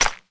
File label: Disc Rico Stone; boomerang fu